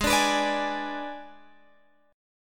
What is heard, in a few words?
G sharp Diminished G sharp, Guitar Open G